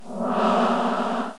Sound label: vampric